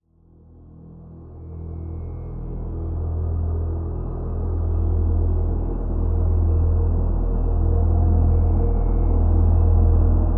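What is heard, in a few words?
Drone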